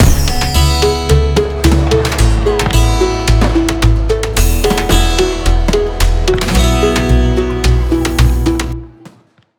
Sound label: alert sound subscriber